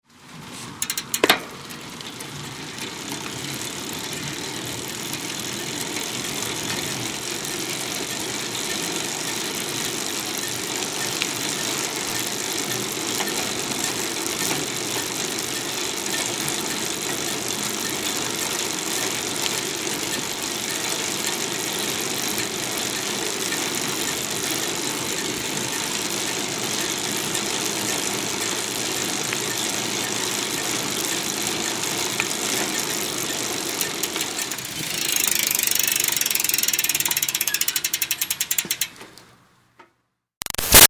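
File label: Bicycle Retro Cruiser Onboard Ride Fast; Vehicles; Bicycle Retro Cruiser Ob Ride Fast; Bicycles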